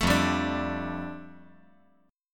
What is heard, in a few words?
F 6th Add 9th F down, chord